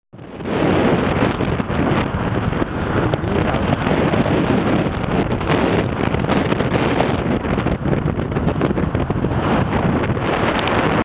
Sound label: wind